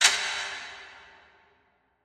designer hi metal hit; SoundsCrate

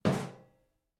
Metal Footfall; soundscrate

